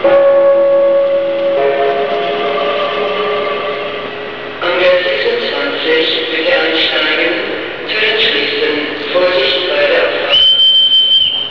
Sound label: Start